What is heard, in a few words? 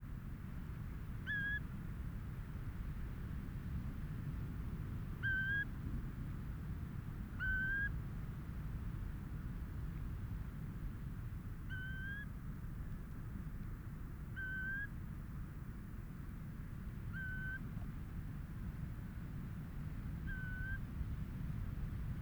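long very low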